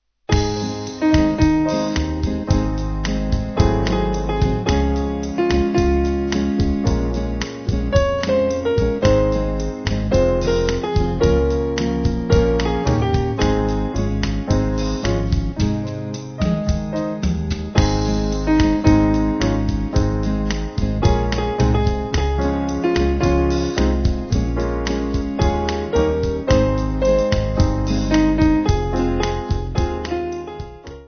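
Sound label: Jazz Piano, church music backing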